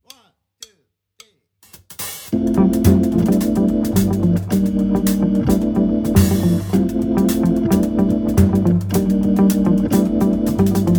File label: Boppin in D-Minor